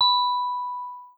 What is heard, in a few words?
Tone, notifications; Motorola Moto-G20; lenovo